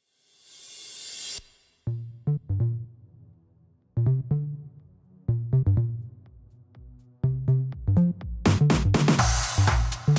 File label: 123 BPM